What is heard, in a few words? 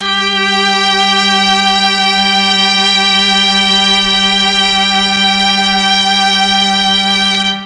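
G3 mixed strings, mellotron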